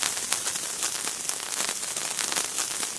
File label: fuse burn; XDM; ambience